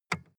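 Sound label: sports car interior lightswitch dull; verkehr transport; autos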